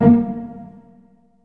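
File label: cello pizzicato A#1, Virtuoso Strings; ILIO; Kirk Hunter Virtuoso Strings VOL-3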